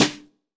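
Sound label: brush snare, GM drum set; AKAI S-Series CD-ROM Sound Library VOL-8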